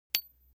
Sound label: object metal small impact medium high brillant